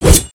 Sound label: Sword Slash; aronwk collection; ndaudio